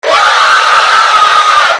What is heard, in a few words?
fear die